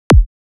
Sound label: red bass drum